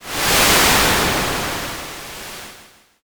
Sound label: wind